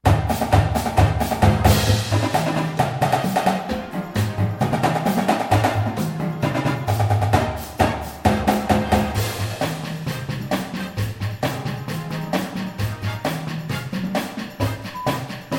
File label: full drumline with music